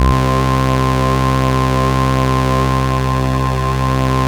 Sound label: C2, synth line; 90 s Sample CDs; NiceBeats; SynthLine VOL-3